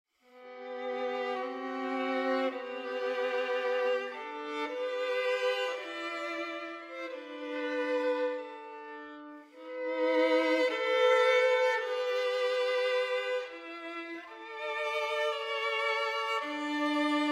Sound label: Violin Romance in G Major